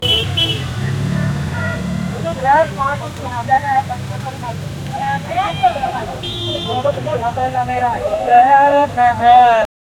ambience street food in Indonesia

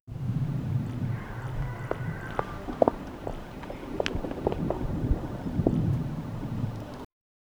background ambience, gentle wind

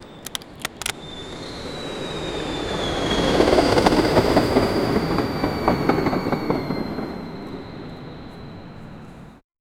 parachute opening, gliding

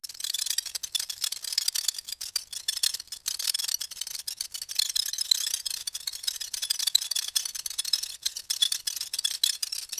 noise of folk rattles

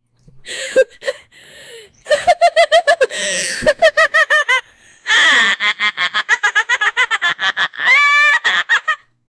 the laughter of a witch

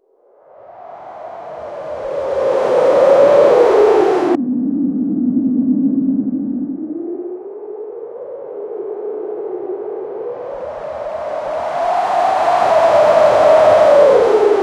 whoosh a rush of wind; clouds

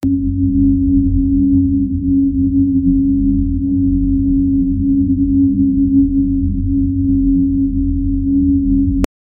deep silent frequency; abstract

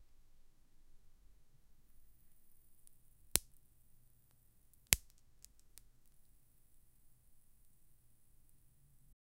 a flower that gently open, blossom

